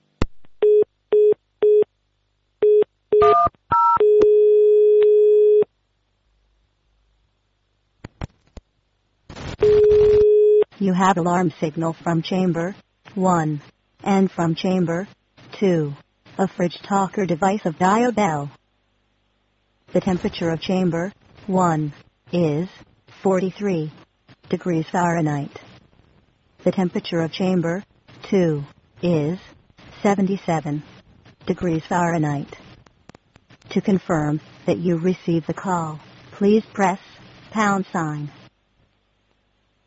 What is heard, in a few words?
Alarm